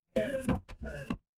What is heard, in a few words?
ui modal close